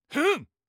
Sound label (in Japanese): 攻撃